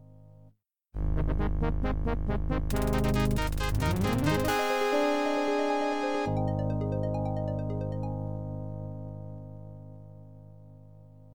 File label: Fanfare; Blood; DOS; Apogee Fanfare